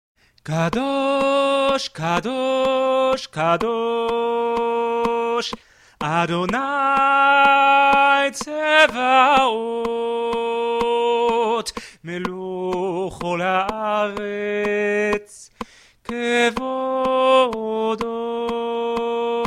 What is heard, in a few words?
soprano, choir; K’dusha; bcc choir